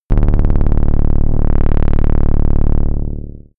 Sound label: shot rattling darkness; pulsieren